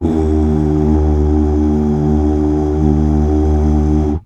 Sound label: UUH